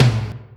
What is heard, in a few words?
tom 4; virtual drum sounds